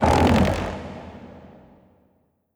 creak; Strider Remake

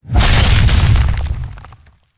Spider Trap Grab Explode; Lurker; Evolve; Gorgon

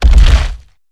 Climb Base; Evolve; Behemoth

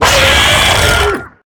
Harpooned; Lurker; Evolve; Gorgon

